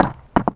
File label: grenade bounce stone; weapons; Modern Warfare